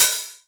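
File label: Hat Open, Novation Drumstation; DrumstationV1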